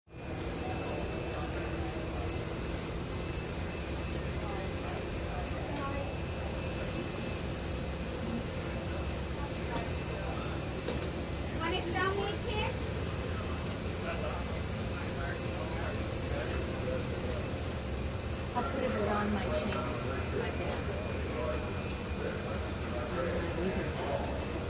Boeing 747 jumbo jet, interior, chatter & engine whine before take off